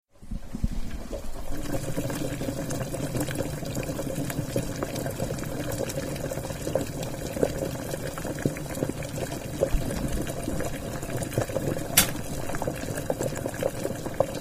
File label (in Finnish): keittaminen